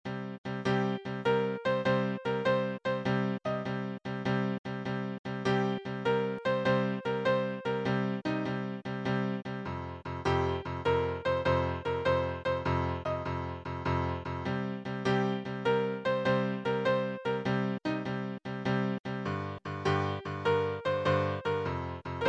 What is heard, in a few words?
power chords in blues form